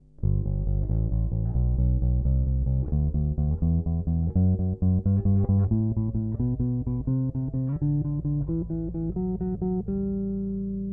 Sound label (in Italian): jbneo4 manico dita chiuso